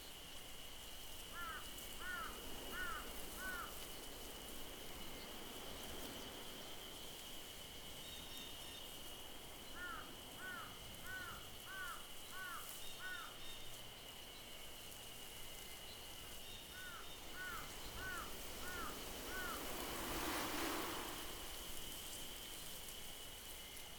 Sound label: outdoor grassland evening breeze; anemoi